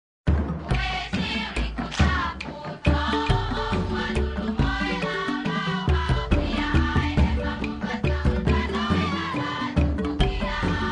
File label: Happy Village; sid meier s civilization revolution 2 2015 vita